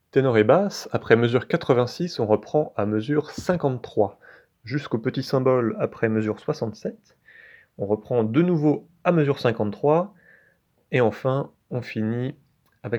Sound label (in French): Tenor et Basse; reprise après mesure 86